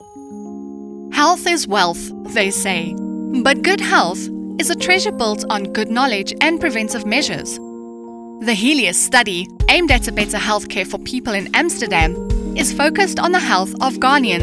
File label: Jingle; RODAM 2020